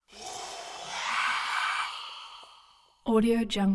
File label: choral wind exhale breath eerie; prosoundfx